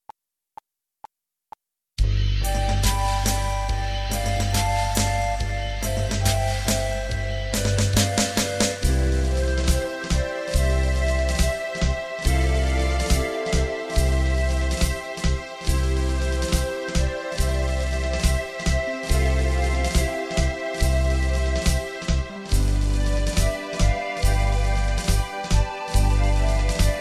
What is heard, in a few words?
Christmas, C, backing track